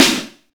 snare sample c sharp key; hits one shots

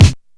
short steel snare drum f sharp key

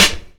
piercing snare drum sound f key; snare drum samples

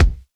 dry kick drum sound c key

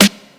clean snare one shot g sharp key; snare drum samples; one shots

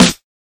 small reverb snare drum sample a sharp key

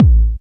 puffy steel kick drum sample a key; kick drum samples

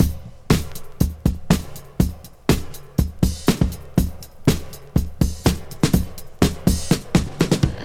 122 bpm modern breakbeat sample d sharp key

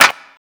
clap f sharp key; percussion; single hits one shots

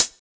clicky pedal hi hat sample A key

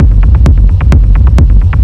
groove hard berlin kick-chord, 129 bpm

